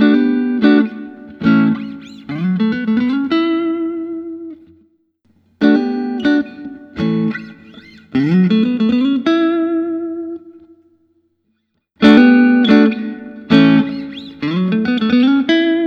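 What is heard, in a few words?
dpergo avc brazilian vintage, guitars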